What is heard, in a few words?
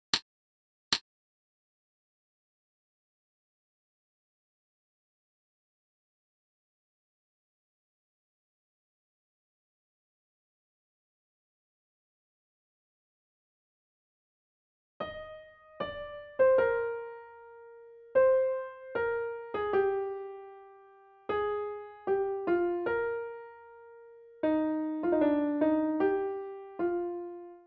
caro mio ben high melody